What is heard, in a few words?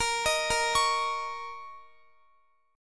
Bb Minor Double Flat 5th Bb, Guitar; Bbmbb5 arpeggiated